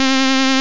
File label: cello str; Epic Collection 3, The; Epic Marketing; muzak